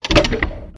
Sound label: quickStop; jingle spin